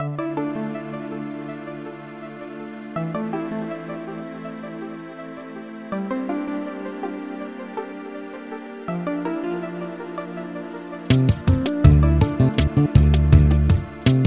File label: ambience